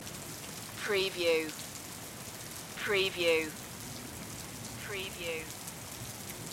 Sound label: rain stereo loop long